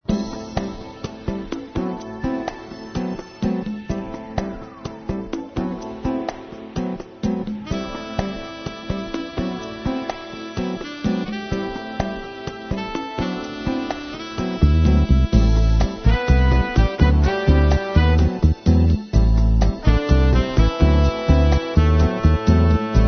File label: jazzy funky bossa cool